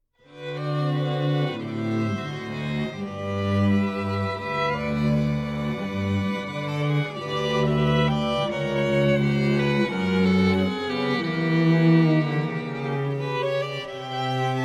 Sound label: Jazz, string quartet